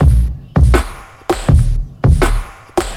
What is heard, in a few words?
Loop